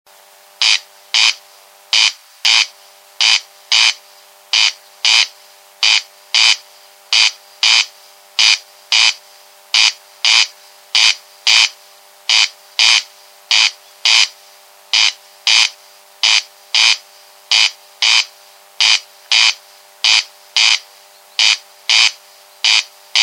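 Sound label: corncrake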